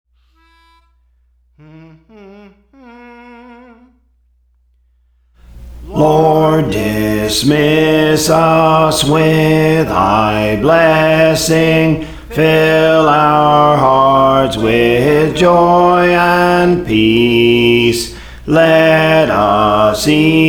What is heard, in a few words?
Bass; Praise For The Lord Training; Lord Dismiss Us; SICILIAN MARINERS